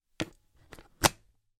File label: household kettle cordless place on plastic base; zapsplat